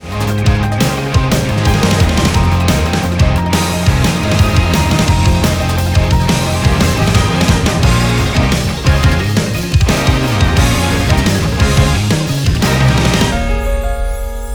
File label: Instrumental